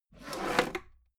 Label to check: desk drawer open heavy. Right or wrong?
Right